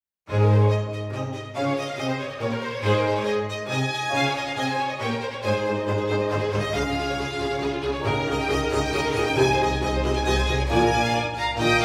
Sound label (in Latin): Offertorium; III De Martyre